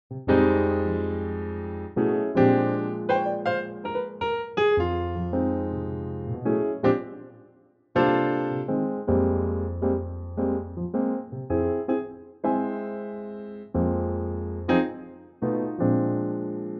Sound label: Fm piano